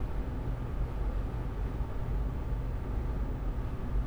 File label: tower lobby